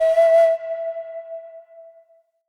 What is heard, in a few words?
Default Flute E; Player Instrument